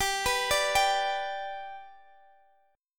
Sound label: G Major down Guitar Open D